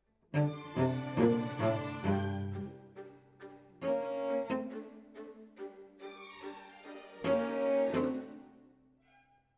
Cello Solo

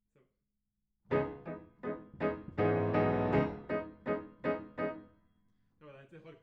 Piano A

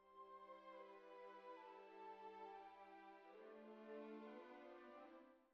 Cbasses

